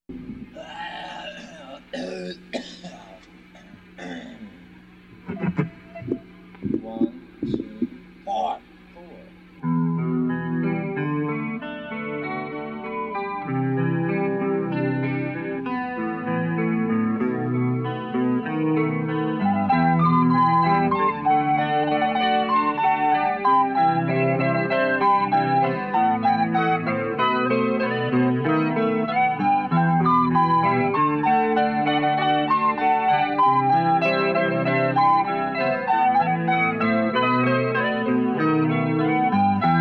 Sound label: Riff Tapes